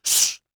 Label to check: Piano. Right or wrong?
Wrong